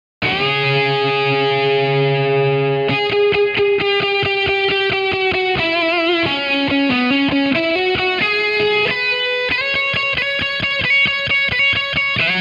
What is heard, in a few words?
les paul amplif; chiqui audio